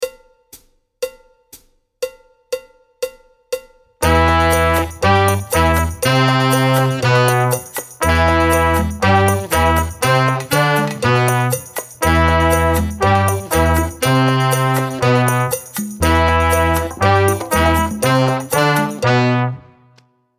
NoDrum

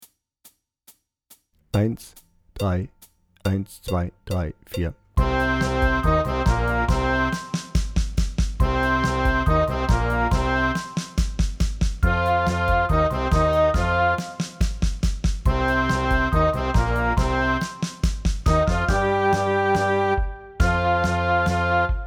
Hard Rock Blues Playback 140

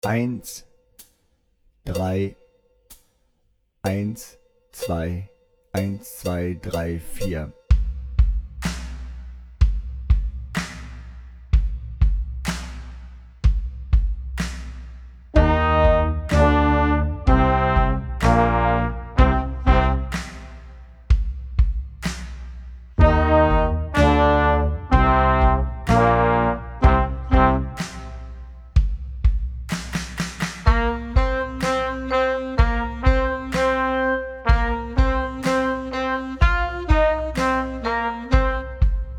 Power Rock langsamer

